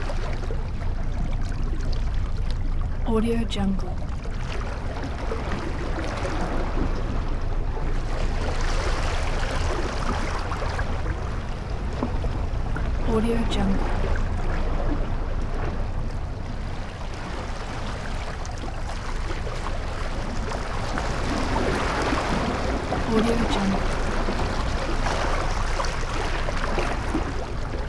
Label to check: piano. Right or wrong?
Wrong